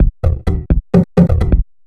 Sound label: VFX2 Loop 128 BPM